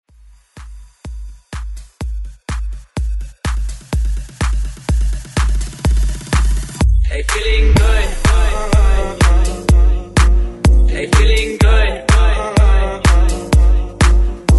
ReDrum 125 BPM

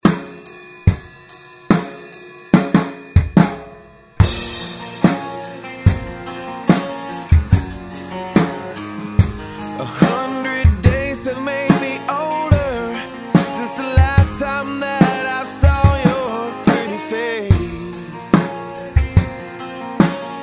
Alternative Rock 72 Bpm; DJDOLLS

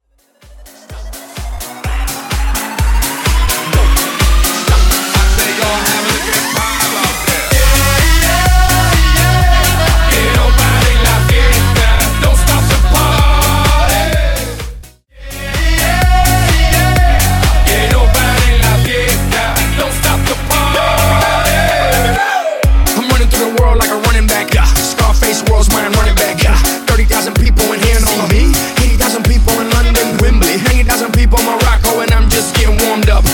Re Drum Dirty 127 bpm

